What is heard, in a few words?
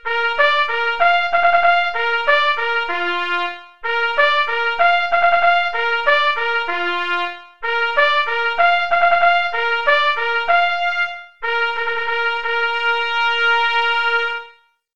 Bugle Call Fatigue (qn=96)